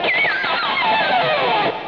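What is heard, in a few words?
treble, Guitar Harmonics; Treble Slide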